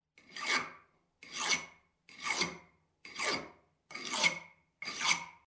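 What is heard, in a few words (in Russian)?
tochat noj, sharpening a knife; Звуки на которых точат ножи; Звуки на которых точат ножи, много разных хороших вариантов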